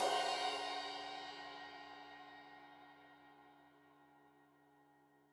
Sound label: Crash 15in L edge, Cymbals Stereo